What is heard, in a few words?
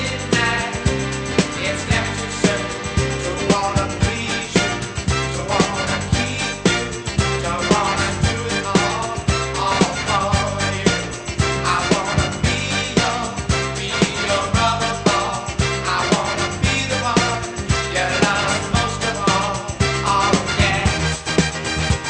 disco